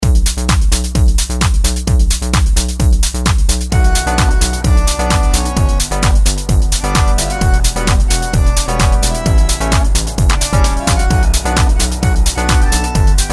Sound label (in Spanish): tango version instrumental